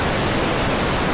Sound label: screaming; wubzzy